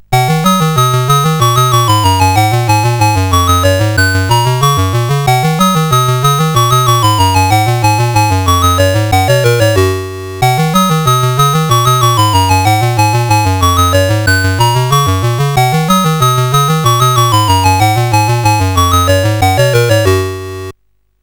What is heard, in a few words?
Quari, CSG8580R5